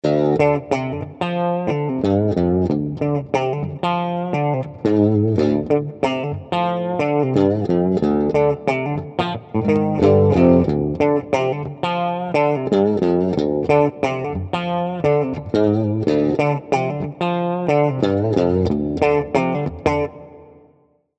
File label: GSP1101 clean; digitech gsp1101